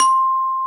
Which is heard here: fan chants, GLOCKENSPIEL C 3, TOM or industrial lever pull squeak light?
GLOCKENSPIEL C 3